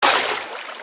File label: dive; Indev+ 0.3 Portable; oldsound misc